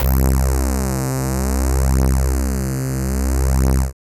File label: syn, Techno; Techno ID